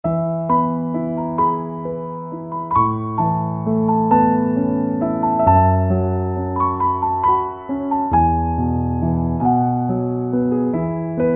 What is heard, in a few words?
Piano Instrumental Ringtone